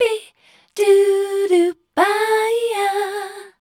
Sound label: MEL A, Voice Spectral II, MELODIC; Best Service